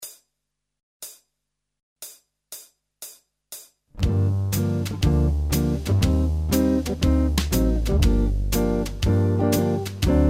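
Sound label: Jazz, playback; Plbck - Gm; MyVirtualBand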